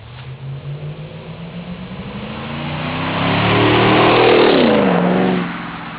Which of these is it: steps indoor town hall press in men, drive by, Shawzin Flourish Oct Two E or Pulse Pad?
drive by